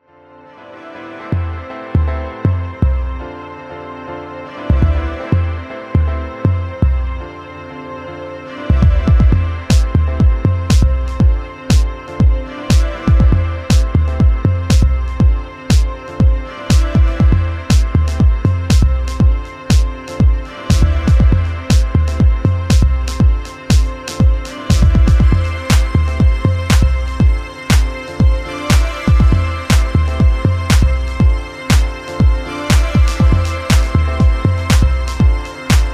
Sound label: Sad Piano